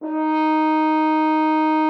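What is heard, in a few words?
French horn D#4; Xsample VOL-3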